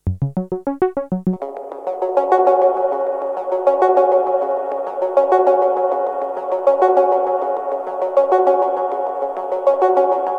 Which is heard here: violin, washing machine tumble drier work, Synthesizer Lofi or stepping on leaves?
Synthesizer Lofi